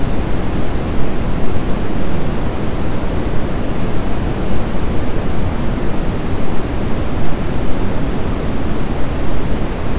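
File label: A320 air conditioning; A32X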